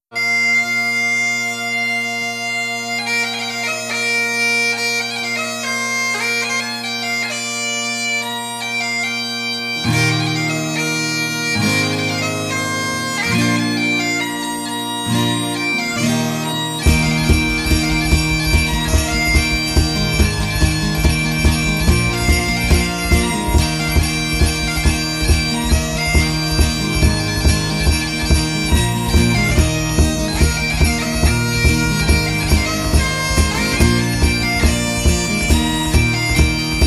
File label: christmas jingle pipes